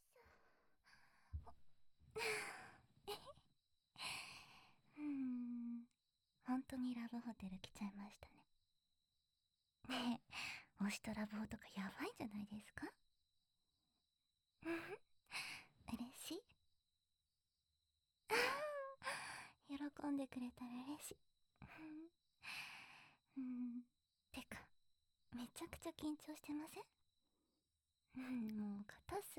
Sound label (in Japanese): 甘々ディープキス